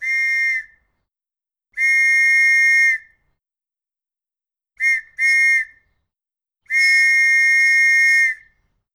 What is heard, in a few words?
whistle blasts